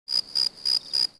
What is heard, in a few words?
crickets; Civilization4; Ambient